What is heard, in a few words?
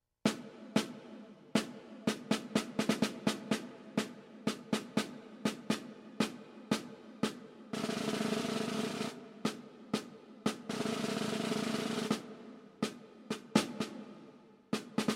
snare drum